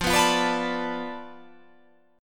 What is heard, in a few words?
F 6th Flat 5th F down, chord